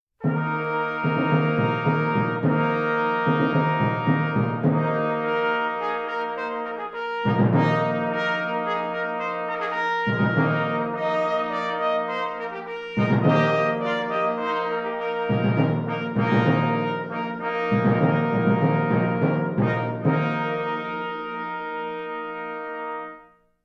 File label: short brass fanfare